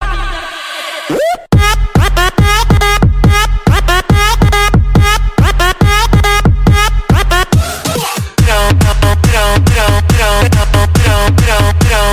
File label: ape drum ghost